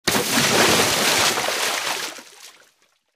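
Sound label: falling sounds; zvuki padenija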